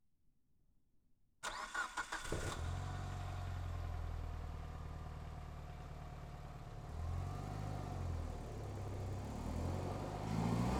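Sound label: Mitsubishi Evo 8 Onboard Start Slow Drive Stop Reverse Drive Stop Off Engine Right; Lancer Evolution VIII 2004; DPA4062; Pole Position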